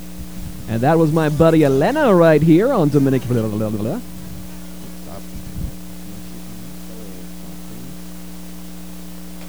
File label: old vocals